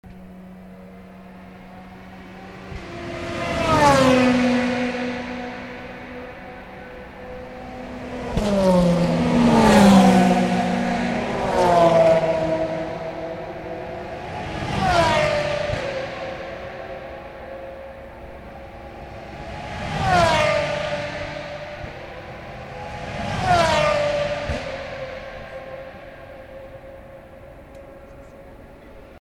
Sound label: Cars after Mulsanne Corner; Saturday afternoon